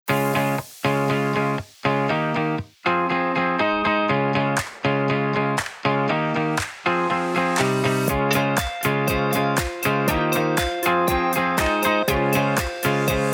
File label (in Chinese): 吉他 Electric Vintage; Session Guitarist; Komplete